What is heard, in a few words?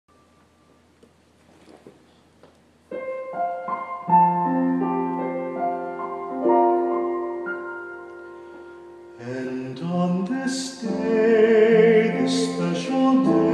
wedding music, unity candle; english